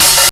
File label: Jomox Xbase888; Drum Machines